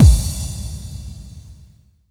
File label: BD-FX, Dance Mega Drums; Best Service